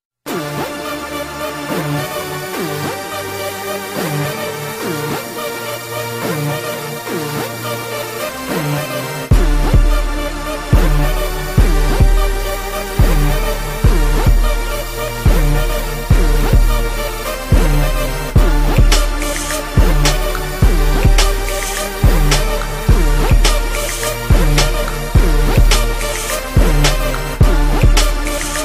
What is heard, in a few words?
beat, hip hop ringtone